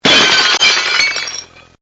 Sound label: impact breaking; Collection Synesthésie; Isabelle Grosse